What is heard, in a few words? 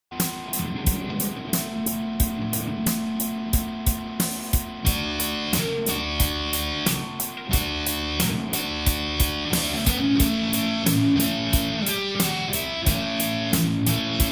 punk rawk